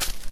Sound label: attack, lynx; Loke